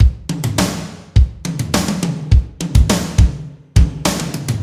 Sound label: sounds music; Halo Combat Evolved; Xbox